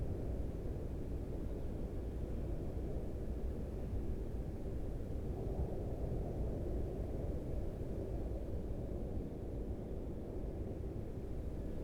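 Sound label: Ambience Interior; Pilot Down Behind Enemy Lines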